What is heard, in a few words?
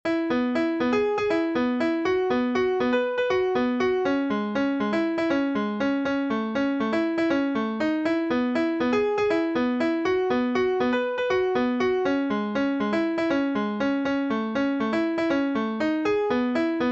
ENDING; FRUIT FACTORY